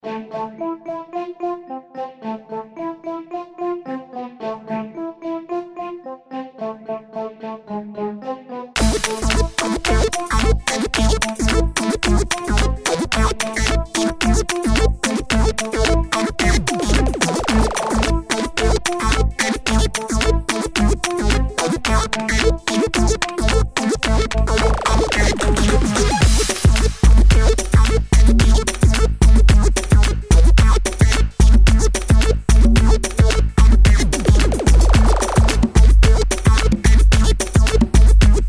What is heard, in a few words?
dancehall